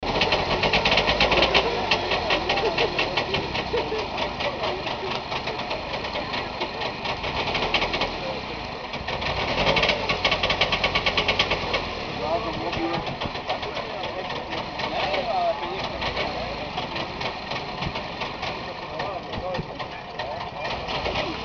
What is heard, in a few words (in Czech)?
Trabi zvuk